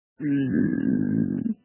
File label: huiiiiiiiiiii